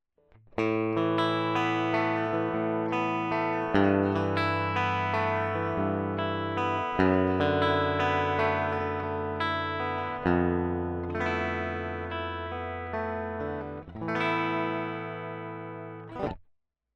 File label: DI American Tele; Echoman